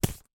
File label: leisure playing card placed slide into deck